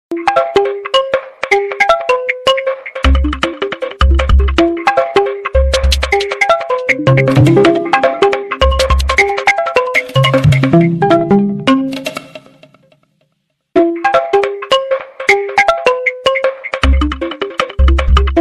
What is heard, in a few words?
Oppo Realme 10 Ringtone